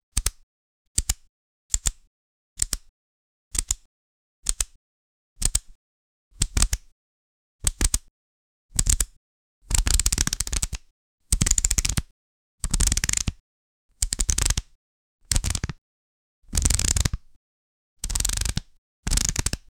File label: a high fidelity soundscap